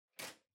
Calculator (Far Switch)

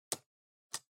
PC Keyboard Shift

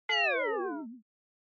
Onoma Negative Short; オノマトペ ネガティブ; 短

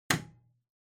Light Switch Rocker, Wall Hard

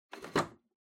TV Hardware RCA Unplug

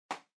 Packaging Air Cushion Far Pop; 梱包材; 梱包用エアークッション; 遠い 弾ける

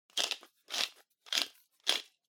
Spice Mill Pepper

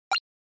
NES Action Hit

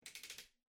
Electric Fan Far Angle

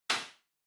Party Popper Far

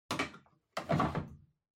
Washer Front Load Detergent Drawer Open

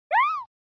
SNES Fighting Scream

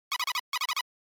GB Fighting Message

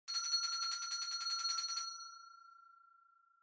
Winning Bell Far Strong

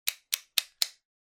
Cutter Click

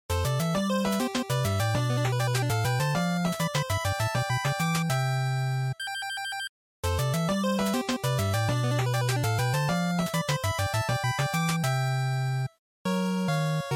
NES Shooter

